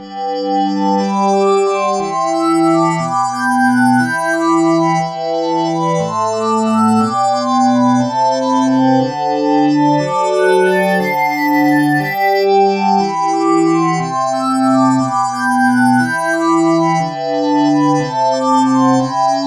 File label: metal pad